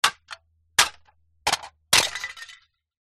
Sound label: plastic thin flimsy hit ground several until break smash